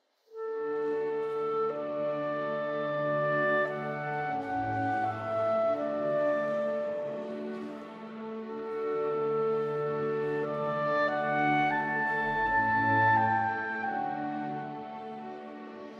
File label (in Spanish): Concierto para Clarinete Adagio